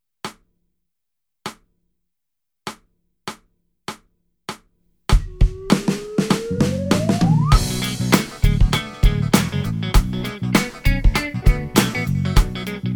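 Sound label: Demo with click, Concert Backing Tracks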